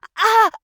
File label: Mage Grunt Hit; Realm Royale